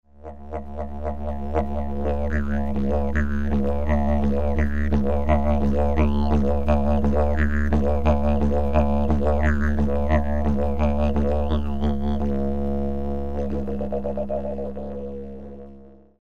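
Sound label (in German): Eukalyptus, Didgeridoo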